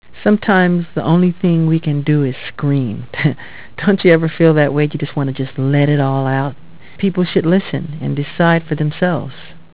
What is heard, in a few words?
scream